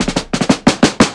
snare engine; Drums; One Shots; Slate; AURORA Sample Pack; Snares

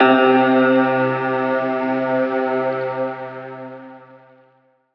tonal oneshot C; Pop; Slate; PASADENA Pop Sample Pack; Tonal One Shots

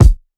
kick candy, Hip-Hop; Drum One Shots; SD CAICOS Hip-Hop Sample Pack; Slate

